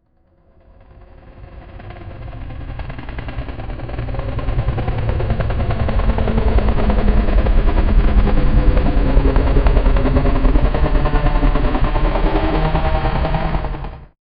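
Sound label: helicopter blades whirring; aircraft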